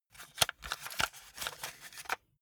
cassette rattle medium

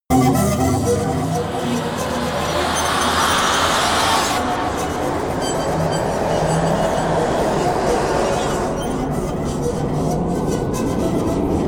atmosphere attacking high

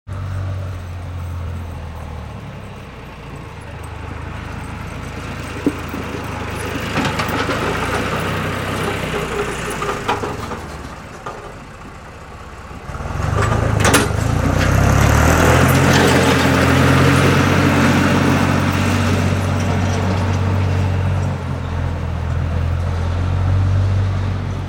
diesel truck snowplough

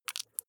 flesh wet greasy squish

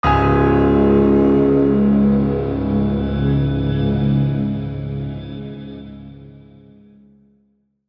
sad piano logo

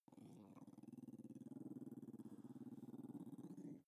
dog snarl long

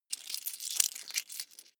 coins clatter heavy long

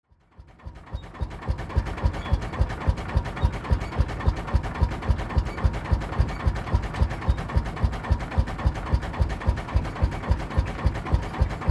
tractor driving

